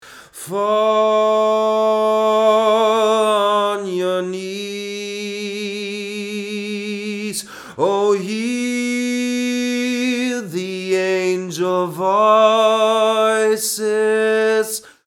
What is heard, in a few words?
ELAM 251 Vocals High